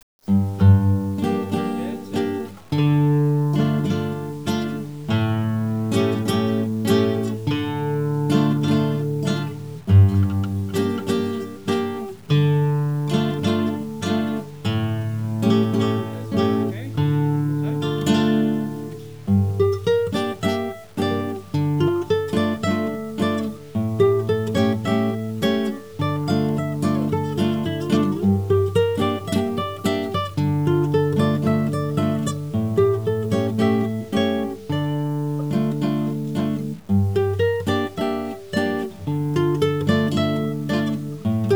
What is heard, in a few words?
guitar; eslmap